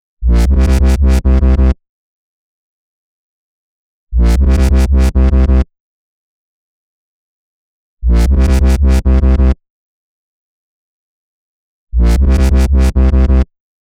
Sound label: 123 B Bass, Complex Electro Synths; Prime Loops; Impulse